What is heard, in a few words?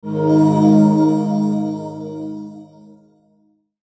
Logo Swell Chimes Low; Sonic Logo Sound Effects; Production Element Imaging Element Accent Transition